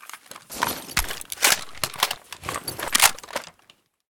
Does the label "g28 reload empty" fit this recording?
Yes